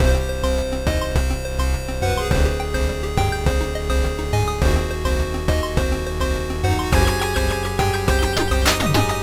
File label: lead